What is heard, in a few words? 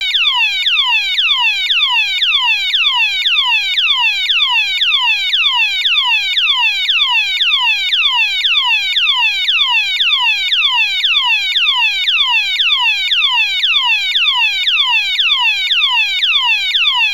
ALARM, Piezo Siren